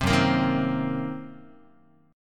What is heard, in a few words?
Gb 6th Flat 5th Gb down Guitar